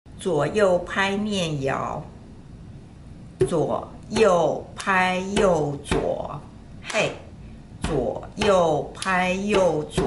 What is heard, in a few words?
Left & Right Chant; Halloween